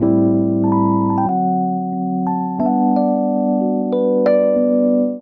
Progression Melody & Chords ii - V - I B Major 92 bpm, Electric Keyboards; Ableton; Factory Packs